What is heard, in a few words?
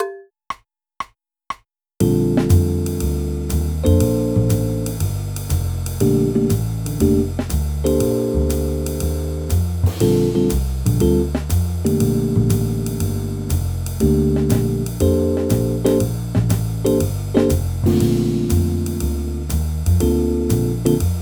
C Major Play Along